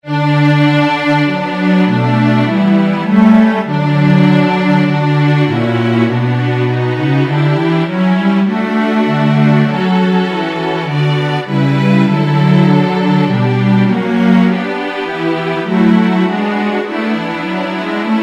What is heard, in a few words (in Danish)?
partitur; Dejlig er jorden